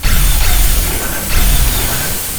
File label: walk backwards, strogg; Quake 4 Alpha; id software; valkaryne